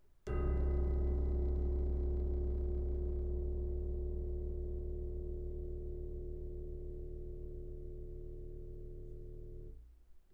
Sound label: Guitar on Winder